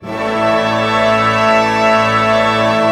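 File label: pentatonic A fortissimo, orchestra; Sound On Sound - Peter Siedleczek's Orchestra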